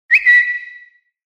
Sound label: iphone whistle